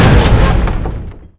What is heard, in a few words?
container hit; polarcomplex